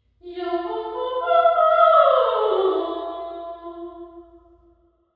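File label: room impulse response sing, concert hall; jack lyons; university york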